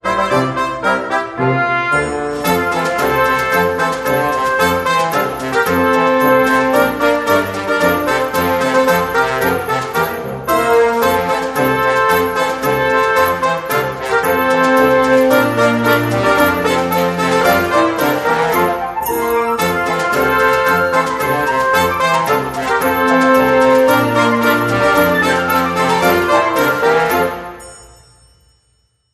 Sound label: Brassy Jingle Bells